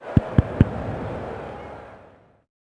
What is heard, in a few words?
bowl tap; springfield